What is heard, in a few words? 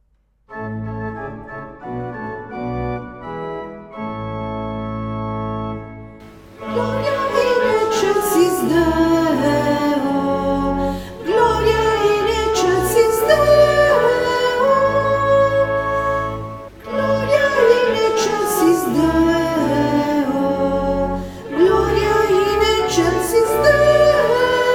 Gloria SOPRANI e TENORI; Gloria di Lourdes 2019